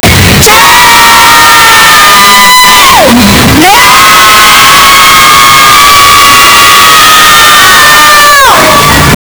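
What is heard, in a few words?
boys and girls are screaming, concert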